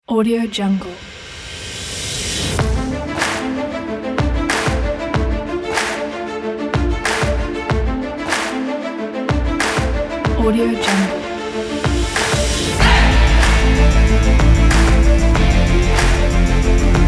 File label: rock; nikita kit